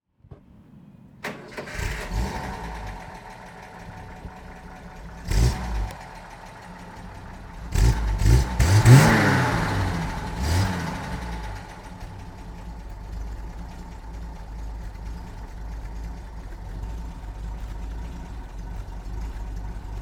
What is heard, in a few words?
Opel Kapitaen